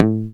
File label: Didgeridoo and Other Primitive Instruments, DOODRUMS